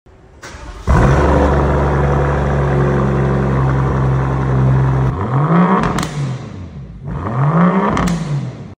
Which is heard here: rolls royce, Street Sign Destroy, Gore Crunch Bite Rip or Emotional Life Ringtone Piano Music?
rolls royce